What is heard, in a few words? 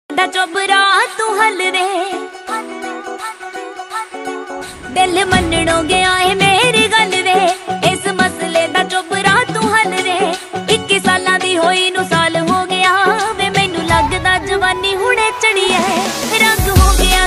song ringtone